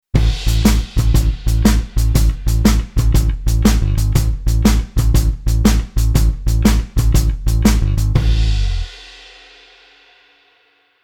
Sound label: bass blues